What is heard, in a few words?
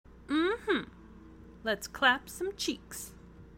clap